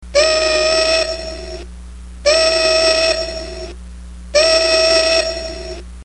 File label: whistle, trainsound